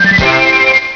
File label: heart; PowerPete Demo